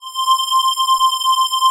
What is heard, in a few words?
ATMO PADS; Best Service; XX Large - Pads